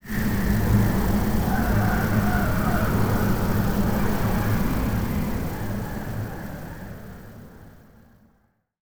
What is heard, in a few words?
living wind; rifts; Stellaris; astral planes